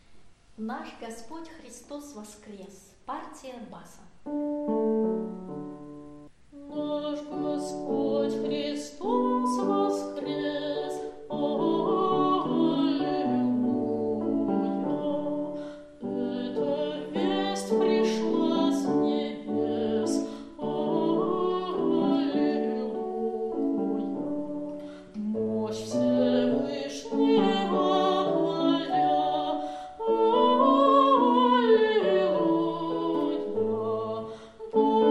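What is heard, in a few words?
bass; Nash Gospod Hristos voskres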